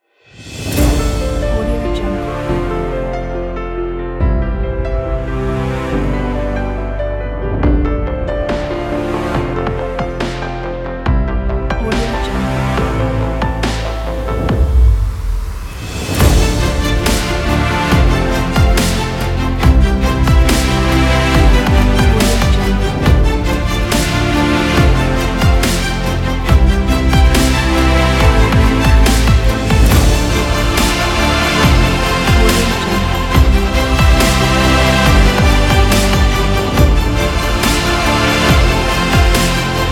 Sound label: dubstep cinematic; edrecords